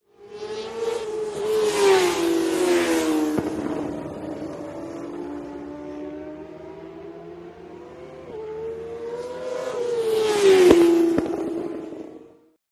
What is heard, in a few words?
Motorcycle Road Racing